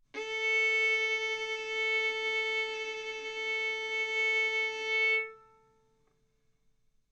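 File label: Violin arco mf A string A4 B4 stereo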